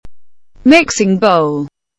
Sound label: mixing bowl